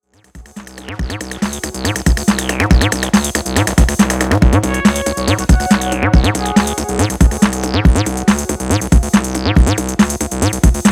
Exercise Drums

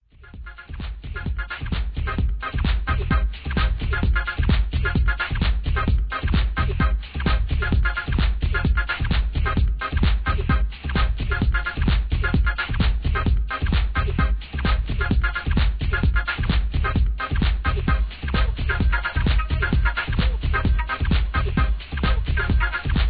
instrumental

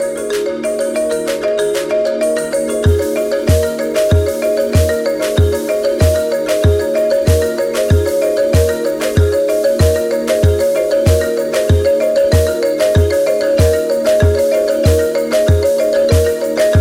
Marimbas

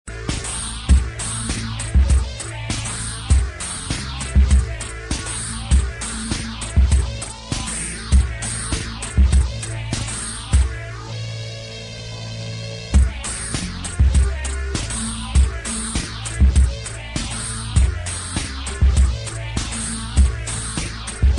beat

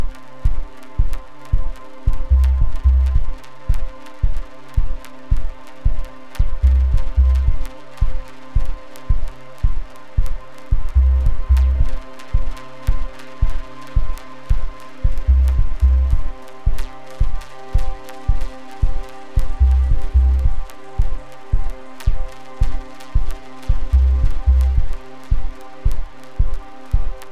Drone 37Hz; Techno

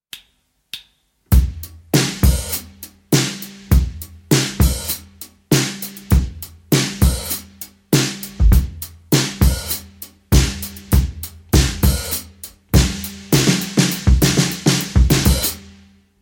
100 BPM Chalky Break

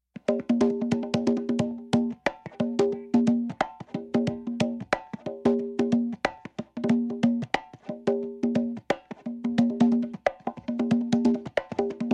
Congas (Stem)

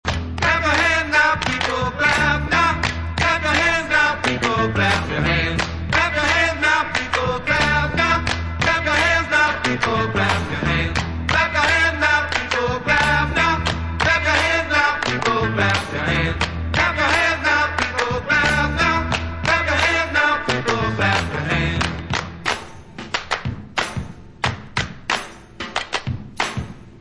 Hand Clapping Song

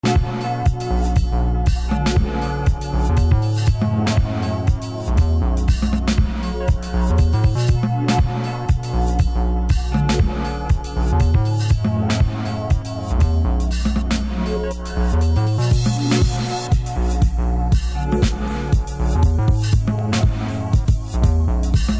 dawn chorus pedal

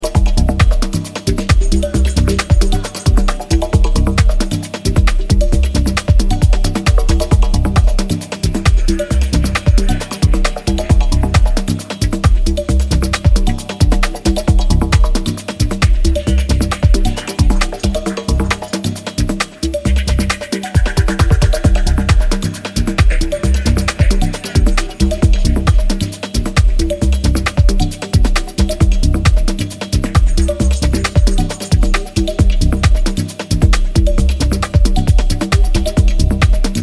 Cave Whistle; Ambient

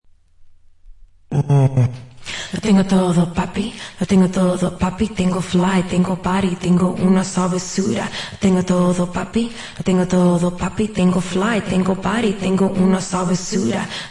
el tigeraso (acapella)

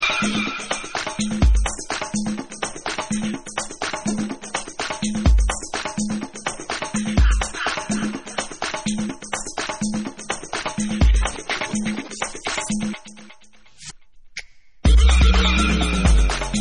drum dub; Disco

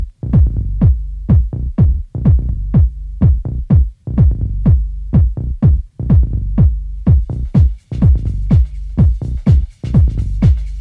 piano track

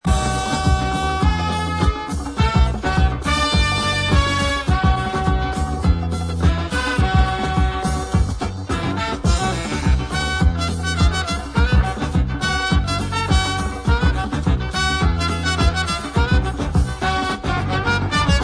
steel drums crackly island reggae funk edition

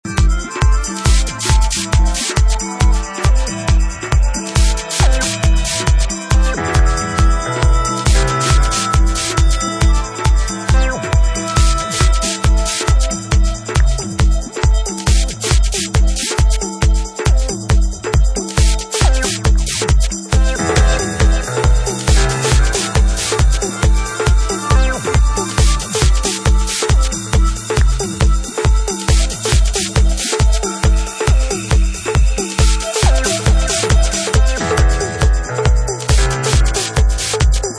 keys strings tambouriness

